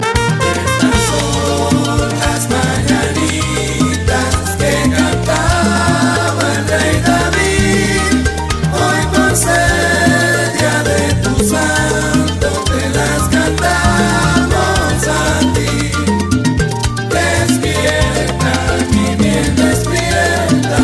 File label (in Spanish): las mananitas salsa